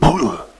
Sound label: pain; caleb